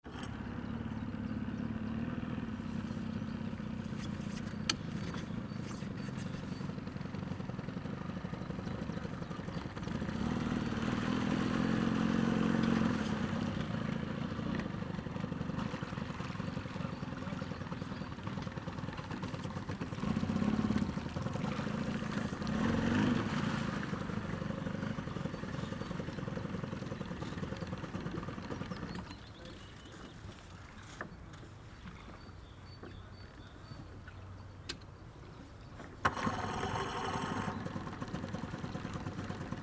boat starting; dlrg

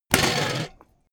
bmx bike grind metal

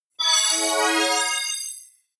appear sparkle positive

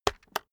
keyboard external enter hit slow

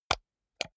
computer mouse wheel click slow hard

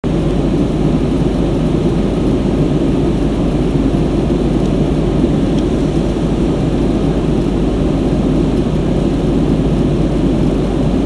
aircondition

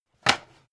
pan stack hard

